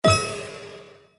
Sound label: reel stop scatter; slots; Magic Coin